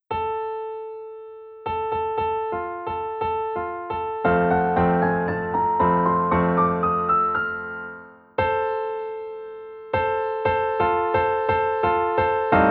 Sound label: Piano Only